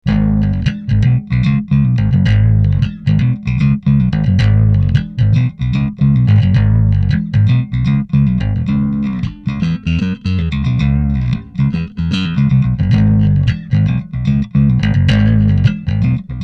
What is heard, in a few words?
riff